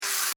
Servo Mechanical SciFi Robot Toy, Scifi Servo E Mechanical Robot Small Motor Toy; BBFX